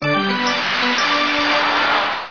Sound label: Base Ball Bat Jingle